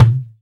TABLA

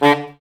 SAX Alto STAB, Alto Section FX

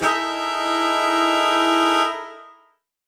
Horn Swell Alt Emaj7b5; undercover samples

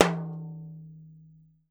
TAMBORIM, Steel Percussion; E-MU Producer Series Vol. 7 – Old World Instruments

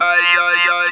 "ay ya ya", Talk Box; E-MU Producer Series Vol. 8 – Platinum Phatt

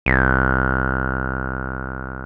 TB 303; VEL SW SET

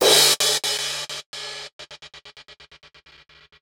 Gated Cymbal; musicradar

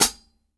CLOSED FOOT, Drumworks; Sampleheads - New York City Drumworks VOL-1; SW KITS

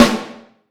AHB, Vintage Snare Drum; Q Up Arts; Johnny.C's Vintage Snare Drum; 5.5X14 SLR

